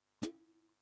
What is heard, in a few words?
Snares & Claps, One Shots